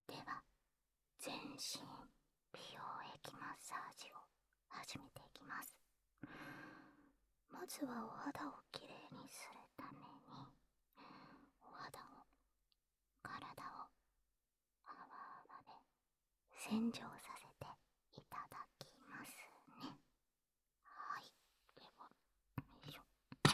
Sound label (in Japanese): 全身美容液マッサージ編; 寝かしつけの音あつめ編